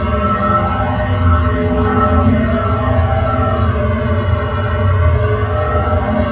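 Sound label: delivery tubes loop, industrial; ambient; Portal 2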